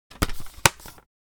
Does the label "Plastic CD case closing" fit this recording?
Yes